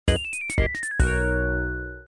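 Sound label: power off; LG SU780 Lollipop 3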